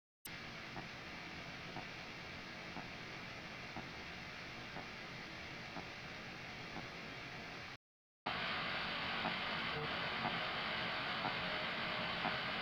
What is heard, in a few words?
kemper clicking